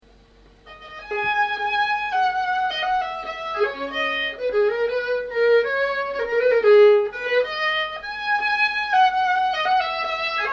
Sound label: Polska solo